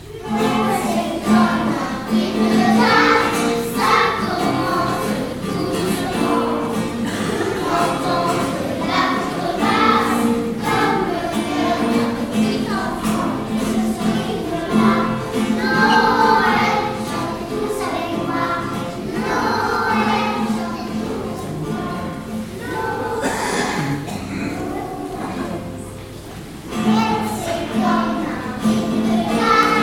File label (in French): Noel Jazz Enfants